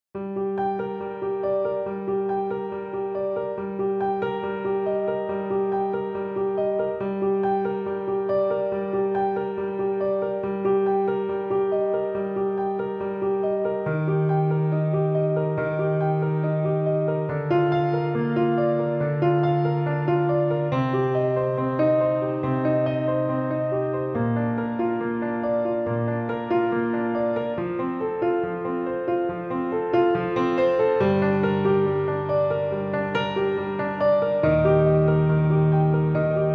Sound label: Piano